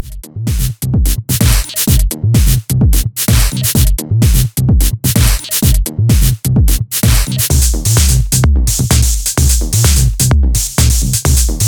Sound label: tech house